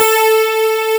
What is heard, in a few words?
SYN, SYNTHESIZER; DIGI WAVE #1